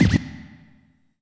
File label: High Scratch